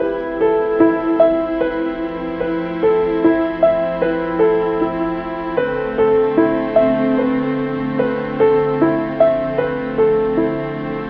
piano, sad

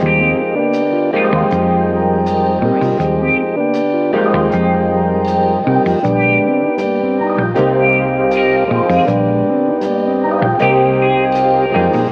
guitar mama bear; Loops; Phonky

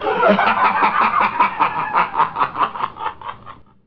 laugh demon

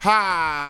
"ha", Voice; ooh ahh

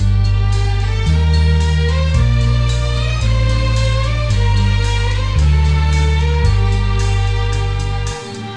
strings, Loops; Phonky

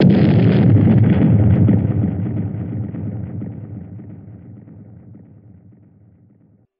explosion; Crash Bang Boom

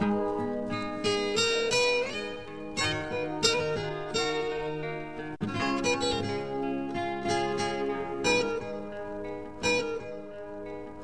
guitar, Sad

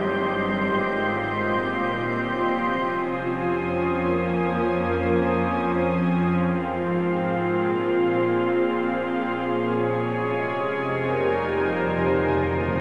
strings ambience, sad loop; Loops

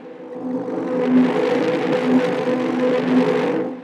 lead; Rumbles

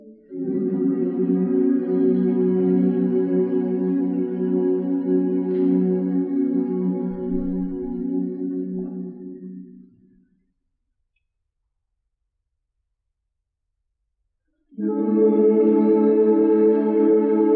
voice choir of eulogy, Loops, Sad

